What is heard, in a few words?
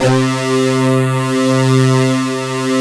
SYN OBX-A, SYN Analog; Sound & Vision; Gigapack I CD 2